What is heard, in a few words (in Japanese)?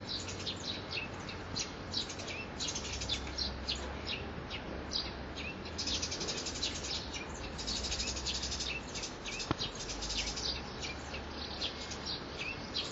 suzume